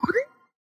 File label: furniture Sold